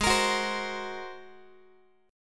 G sharp 9th G sharp Guitar; G#9 strummed; JGuitar

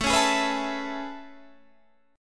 A Major 9th A

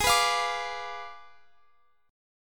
G sharp Diminished 7th G sharp, Guitar Open F; G#dim7 strummed; x,x,20,18,20,21

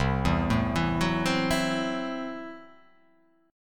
C 6th Flat 5th C down

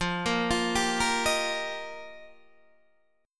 F 9th Suspended 4th F Guitar Open D, chord

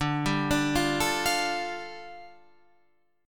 A 7th Suspended 4th Sharp 5th, D

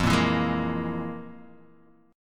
F Minor Major 7th Double Flat 5th Guitar Open D; 3,1,2,2,1,x; down; chord shape

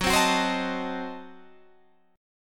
F sharp Diminished 7th F sharp Guitar Standard; strummed; JGuitar

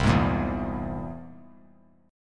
C Augmented 7th C down 3C, 3F, 3A, 3D; strummed; JGuitar

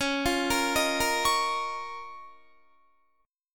Db 6th Add 9th Db down Guitar; Db6add9; arpeggiated

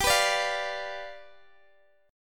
G 7th G down Guitar DADGAD

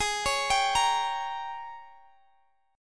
G sharp Major 7th Suspended 2nd Suspended 4th; arpeggiated; x,x,18,18,20,18; G#M7sus2sus4

